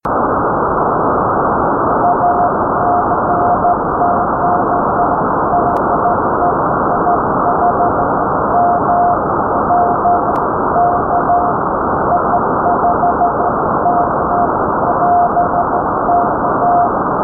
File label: CW